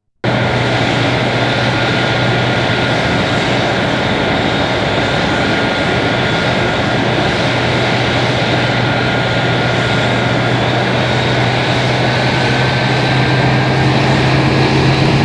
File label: Cfm56-7b Exterior; synchrosoft